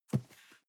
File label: cardboard box medium grab slide; objekte